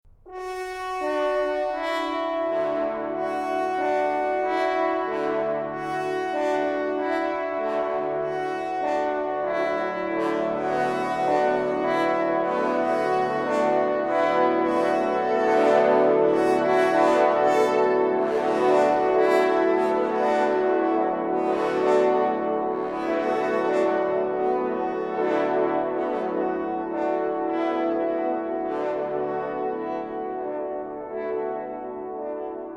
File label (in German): Glocken, parforcehornmusik